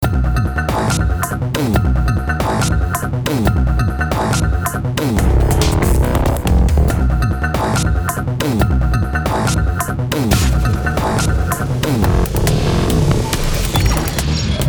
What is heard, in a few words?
Electro Cinematic